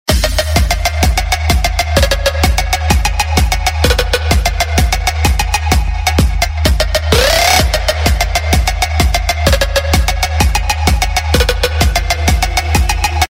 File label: EDM Tone; Ringtones